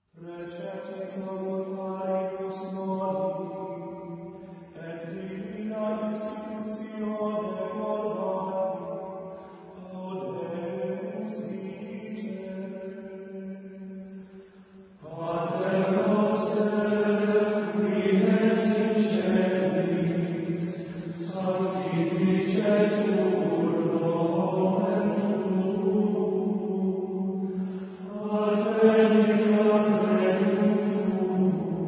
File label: Pater noster Gregorian Chants; 古典（格列高利）